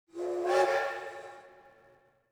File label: train toot; prague; Soldier Of Fortune II Double Helix; XBOX; ambience